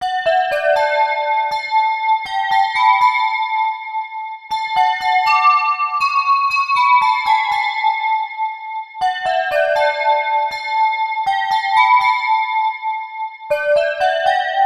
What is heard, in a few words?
for 2 octave handbell choir c4 c7; 4 pieces